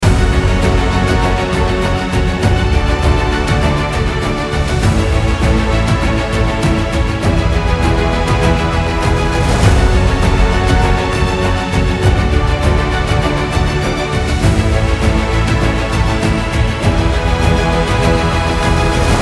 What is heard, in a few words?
epic heroic loop